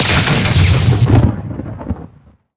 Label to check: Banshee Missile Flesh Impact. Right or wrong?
Right